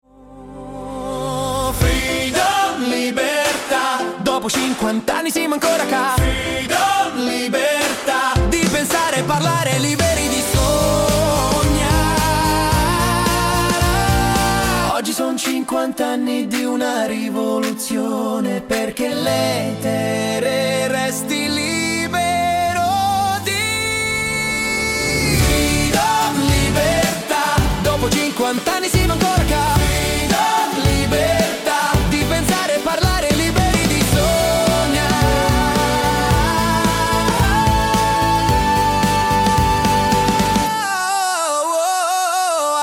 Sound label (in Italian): Sigla Ufficiale